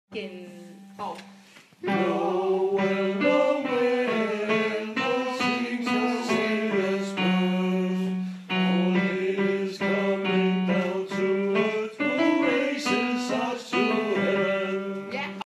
the babe in bethlems manger laid refrain tenor

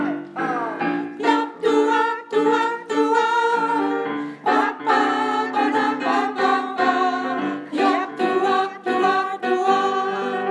jab duab sopran

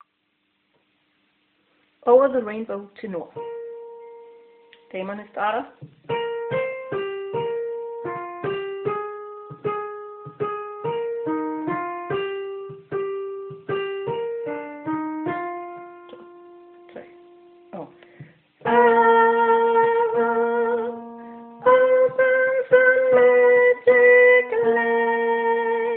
tenor

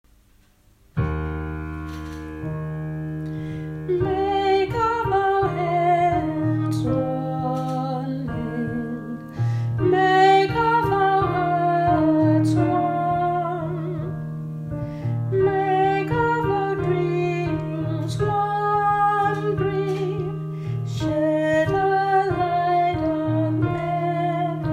colors of peace sopran

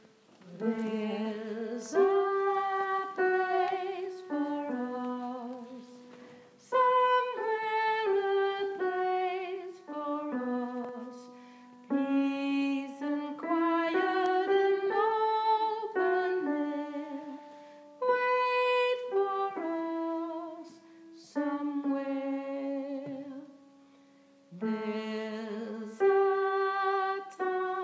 sopran

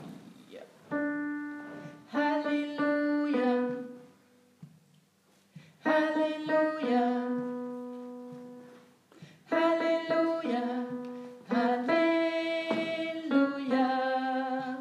halleluja tenor

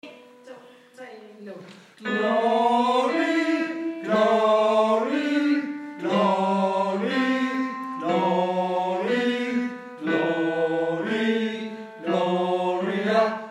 tenor

